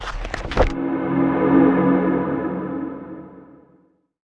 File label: Book Open; Dark Dimensions: City of Fog